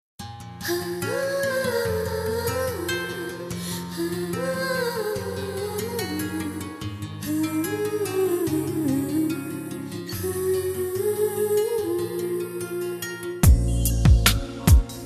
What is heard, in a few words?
Karaoke; Aaru